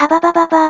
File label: alert